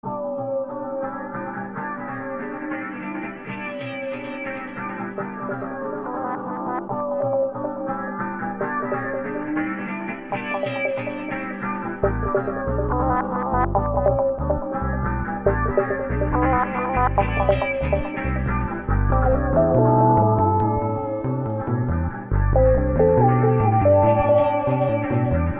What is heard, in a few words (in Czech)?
jingle